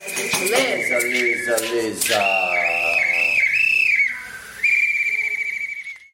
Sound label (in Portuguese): vinheta; educomunicação 2015